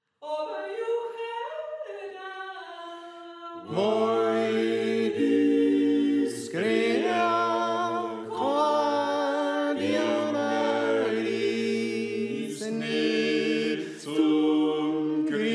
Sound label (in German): Tenor